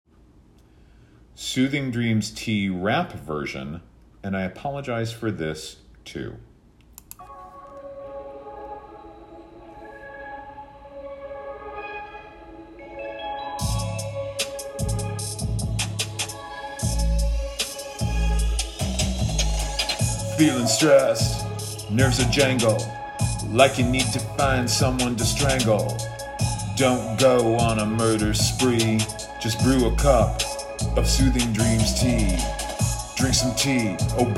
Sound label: Rap Version Vocal